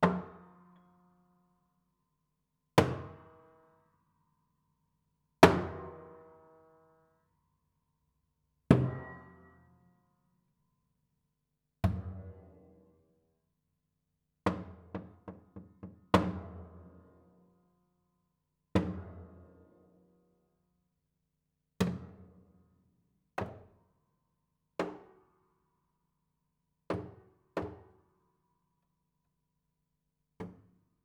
Body Thump Sparse, Piano Destruction

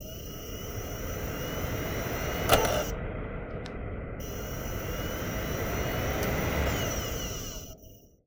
Spin Up, DVD Drive Internal

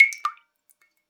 Drips

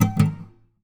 Classical Guitar Double Damped Pluck; Classical Guitar Extended Technique Stereo

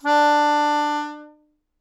Melodica Long, Long Notes Chromatic

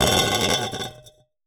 Fast Ricochet, vase

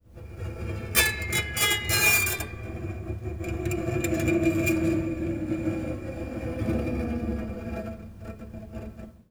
String Scrape, Piano; Piano Miscellaneous

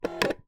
Single Shunt, DVD Drive External

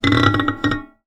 Classical Guitar Extended Technique

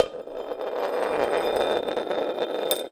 Glass Drag, Medium Wine Glass

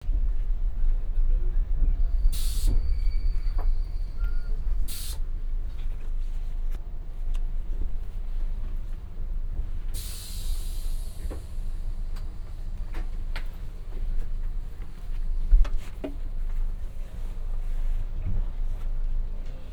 Liverpool Bus Journey; Sound Scapes

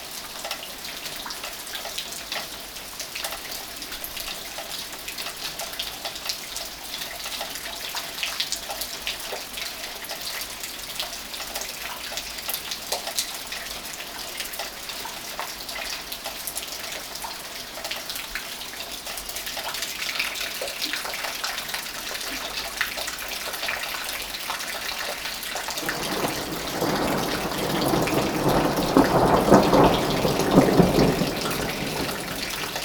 Rain And Hail On Ladder; Weather

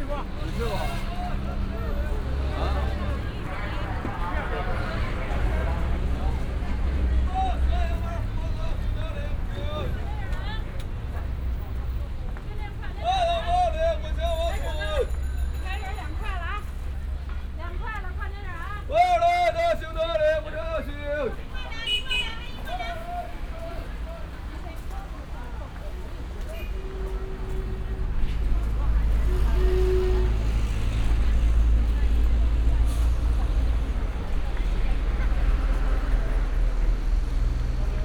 Beijing Bus Journey Shortened; Sound Scapes

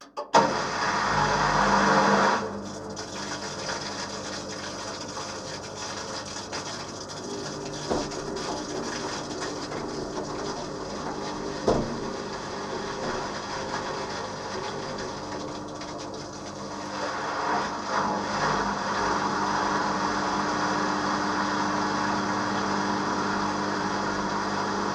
Steady State, Washing Machine Wash Spin Cycle

